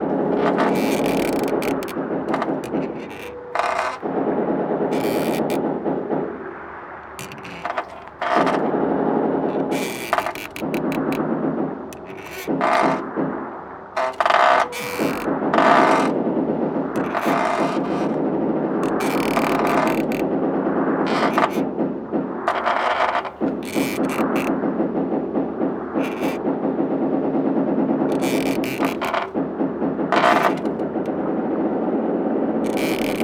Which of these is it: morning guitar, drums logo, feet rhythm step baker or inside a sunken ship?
inside a sunken ship